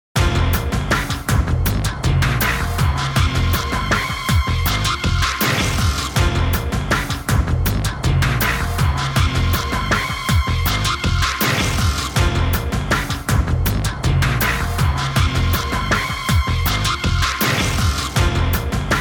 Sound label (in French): Aventure jingle; Jingles medley